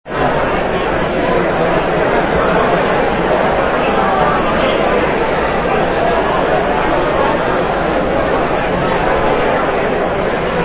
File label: Dining sounds of 500 people at reception.; Huge Banquet Ambience; Human